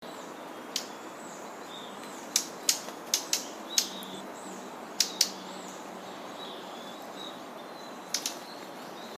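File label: koruri, Siberian blue robin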